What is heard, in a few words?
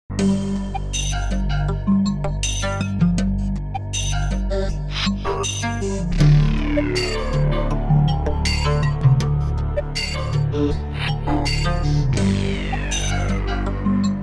Retro Crime Movie Loop